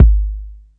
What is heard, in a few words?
dynamo kick, drum machines